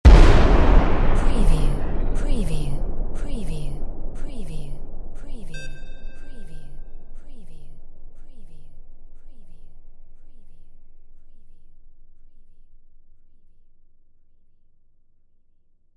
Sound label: WAR CANNON; windy beach audio